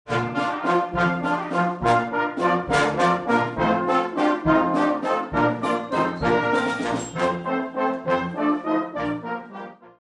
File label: Kinderwalzer, Brass Band